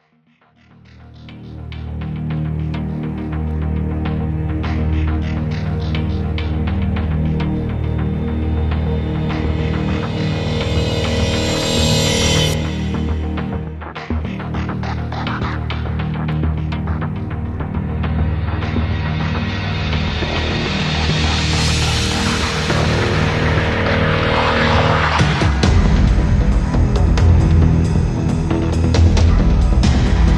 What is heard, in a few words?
TRIBAL CHALLENGE